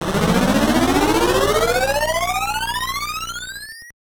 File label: Takeoff!; startropics ii zodas revenge; Argonian